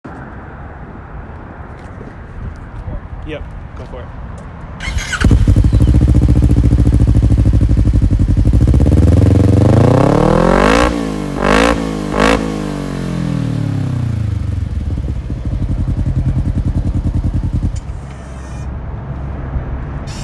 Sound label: Kawasaki KRX Exhaust